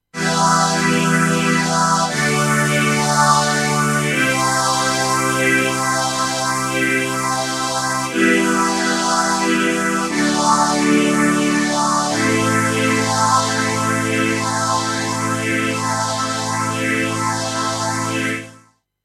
Vintage String Ensemble, tc electronic Helix

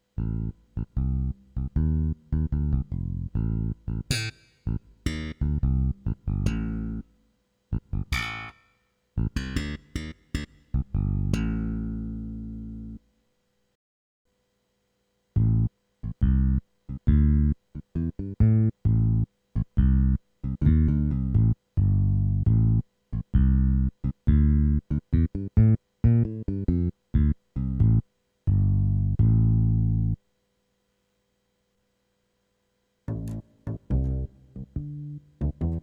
Bass

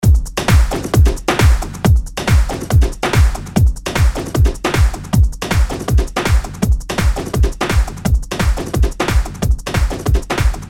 timestretch 132 to 188

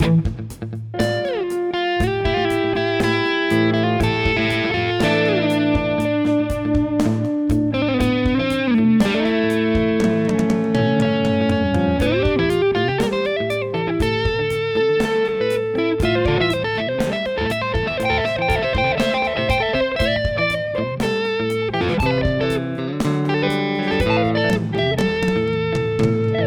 Ibanez RG Neck Gain

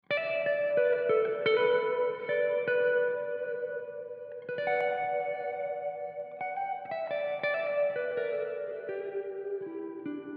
Guitar Delay and Reverb Full M100

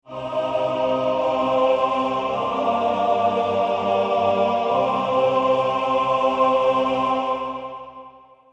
MansChor

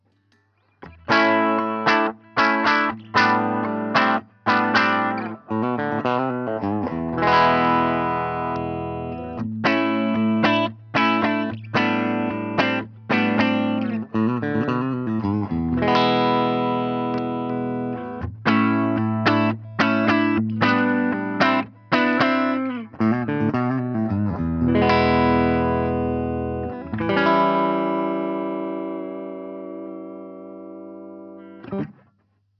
335 clean; Class

